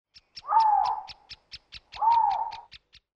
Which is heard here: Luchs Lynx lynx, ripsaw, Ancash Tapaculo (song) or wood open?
Luchs Lynx lynx